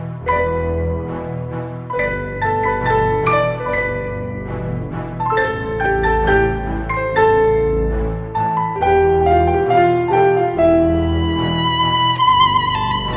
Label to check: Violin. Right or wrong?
Right